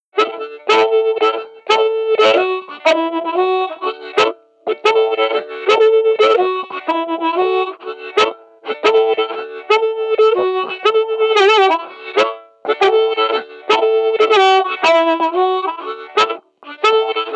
Harp Ending, Blues; Digidesign; Pro Tools; Pro Tools Essential Demos; Essential Loop Demos; Demo Session

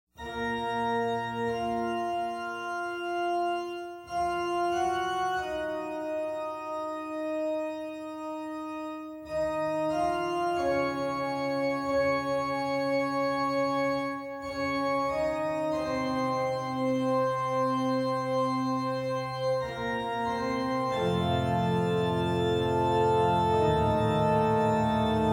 Funerale, for voice & orchestra; Cirque du Soleil; Corteo

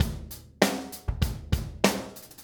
098 Steady Drums; Pro Tools Essential Loops; Pop Rock; Moderate Tempo

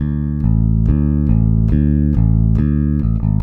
Country Bass Verse2; Digidesign; Pro Tools Essential Loops

